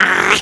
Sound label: preattack; creep; greevil